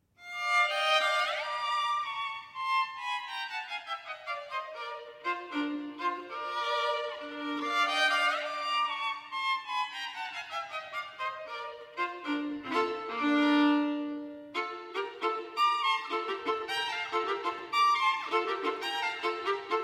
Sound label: Solo Violin, B-Flat Major; 24 Caprices; 'The Devil's Laughter'; Allegro